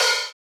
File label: Hihat Open Rough